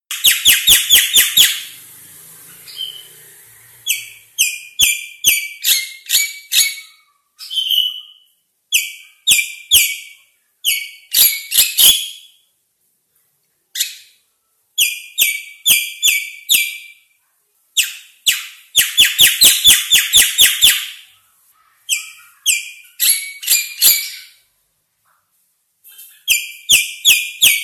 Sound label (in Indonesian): suara jalak kebo gacor durasi panjang